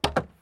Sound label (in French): coffre